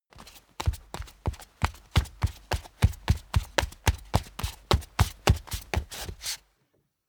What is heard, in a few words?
step barefoot wood parquet run sequence; schritte; menschen